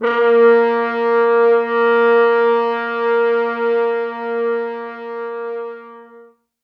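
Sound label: 4 French Horns soft mutes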